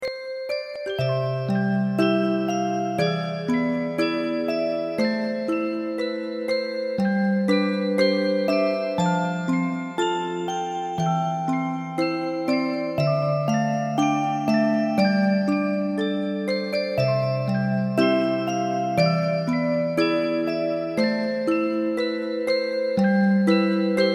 Lullaby; rpg maker mv

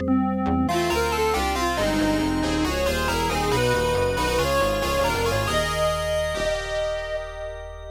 Stage Clear; Legend of Game Music Premium Box; Section Z

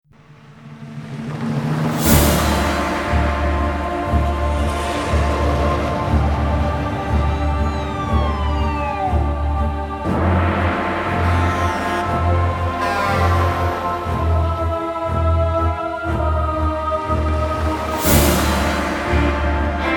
Relaxing; Serious Sam 2

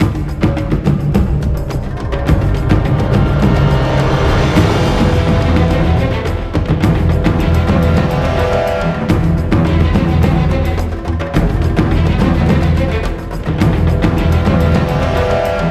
Low Combat

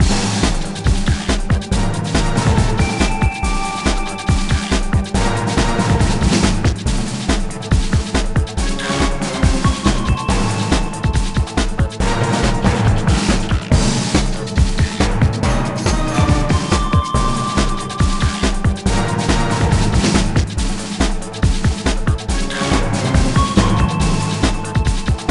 Music Meteor Defense

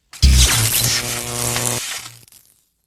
sound Death Electrocution

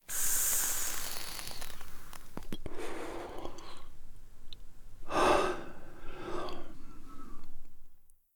Pipe; the 39 steps

